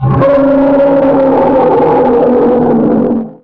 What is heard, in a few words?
die; RYL 2 v1673; omutten